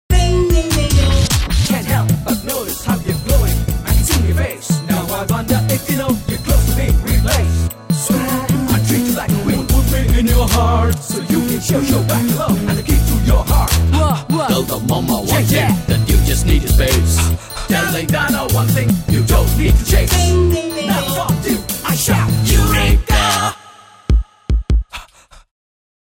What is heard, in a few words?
Rap (Bit Song); Thoovanam 2007